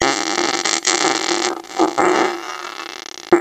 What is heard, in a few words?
farts